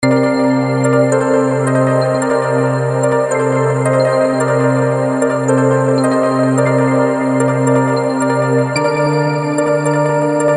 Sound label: bouncy bells; Arturia V Collection 9